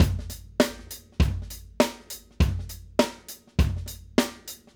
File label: Acoustic Live Drum; Platinum Audiolab